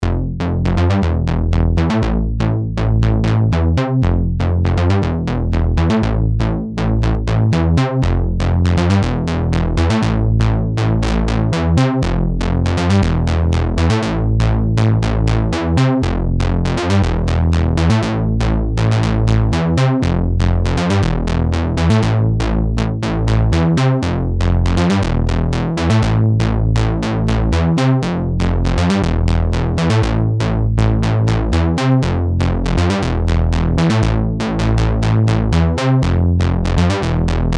KARP SEQ

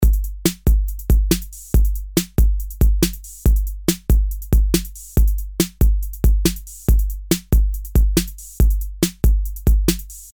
Pre Amp +20dB Drive

